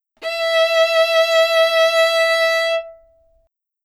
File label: Violin Note 660